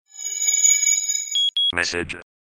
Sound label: Space Message